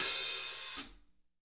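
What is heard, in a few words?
hat open close, drum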